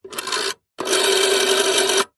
household telephone old fashioned rotary style recorded underside dial no six